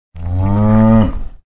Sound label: cow moo